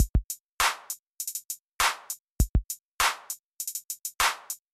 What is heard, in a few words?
Adding bass drums; 拉丁陷阱音乐